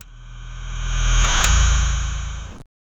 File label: car engine that transform; human sounds